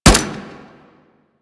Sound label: Fire Mech; Warframe; Weapons; Burston